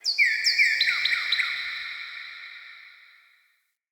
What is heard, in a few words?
Cardinal Female React Positive; Objects; Thawtide